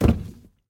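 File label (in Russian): короткий быстрый глухой звук закрывшегося ящика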